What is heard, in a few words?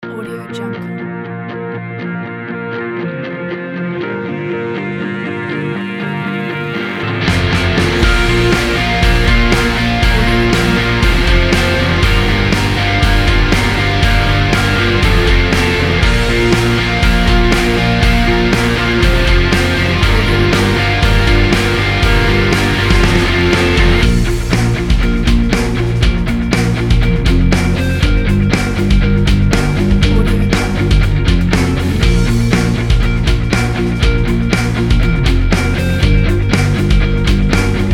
rock summer